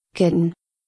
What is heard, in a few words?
kitten